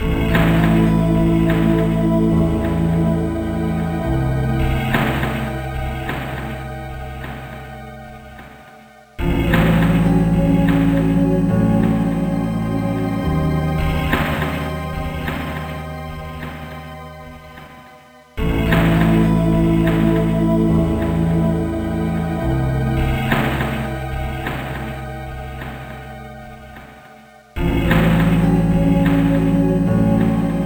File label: Horror TV